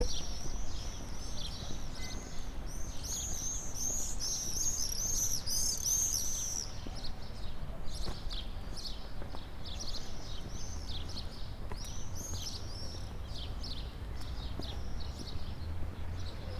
martinet ville

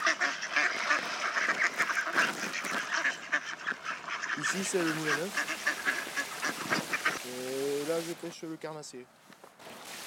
Le Moulin Neuf

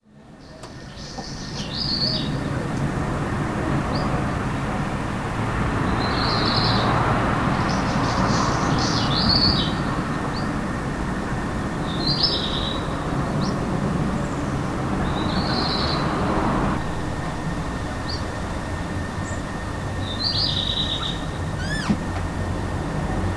Rouge-queue noir

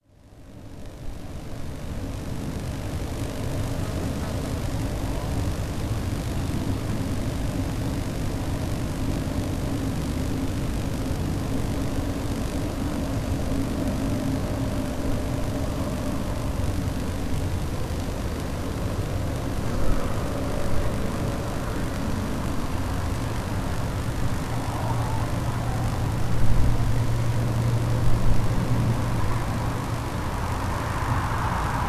Ligne HT

